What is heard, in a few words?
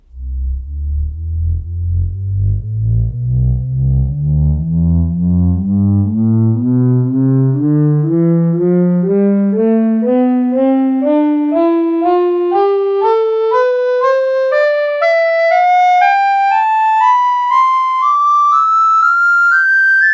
recorder; tonleiter